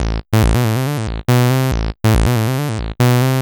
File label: TECHNO SYNTH 140; Keys